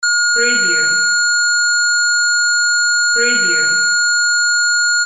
beep

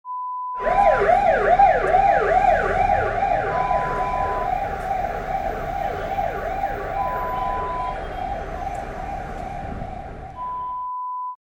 Siren sound

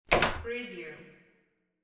door open

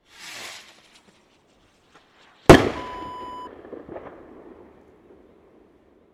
firework explosion

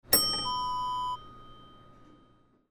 elevator bell ring

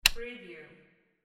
light switch

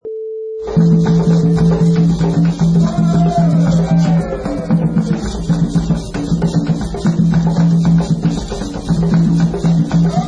African Drum Party

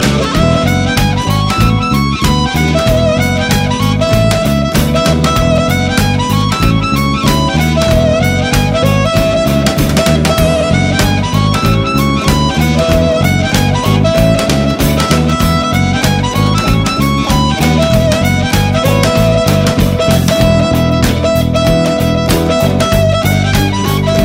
ringtone

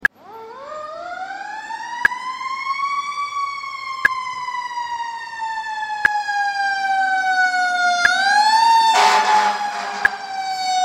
Fire Engine

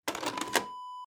vcr tape push in

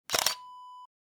camera shutter

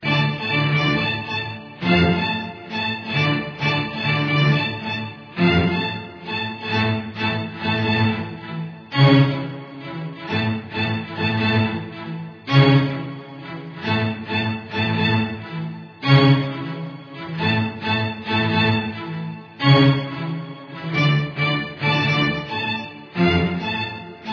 D Minor Dark Strings

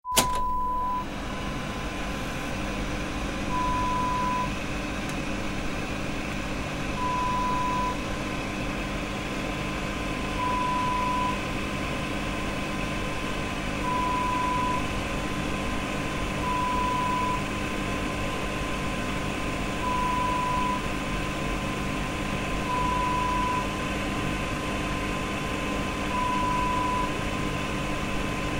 Microwave Oven sound

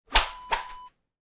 whip whoosh

